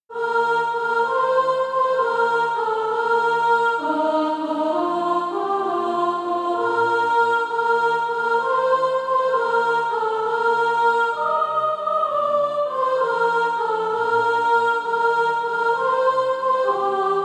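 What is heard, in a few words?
soprano, SATB Practise Music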